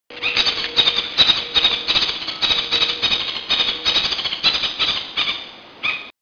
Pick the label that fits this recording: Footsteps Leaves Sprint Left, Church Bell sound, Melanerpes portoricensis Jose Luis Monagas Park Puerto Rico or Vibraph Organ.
Melanerpes portoricensis Jose Luis Monagas Park Puerto Rico